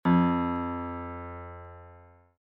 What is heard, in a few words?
Piano ff F2